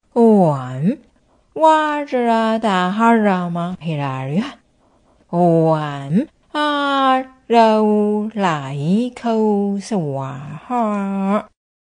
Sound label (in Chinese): 大悲咒咒尾 Mantra Heart